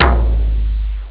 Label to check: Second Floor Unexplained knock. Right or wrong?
Wrong